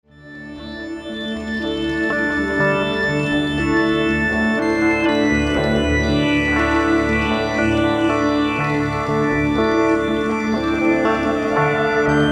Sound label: Theremin; atelierTheremin